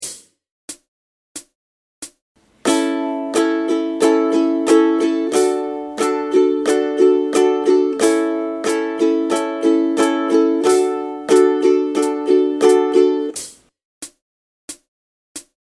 Strum, ukulele

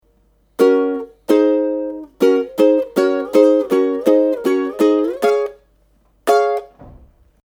Twiddle, ukulele

